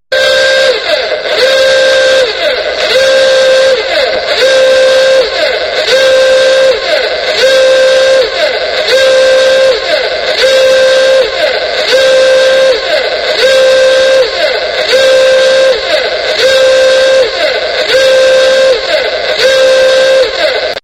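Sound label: World War 2 siren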